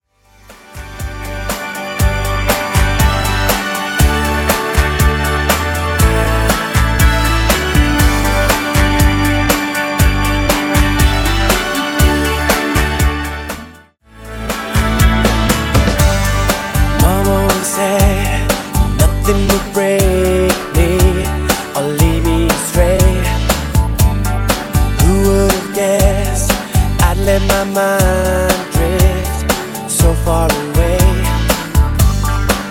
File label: Re Drum 120bpm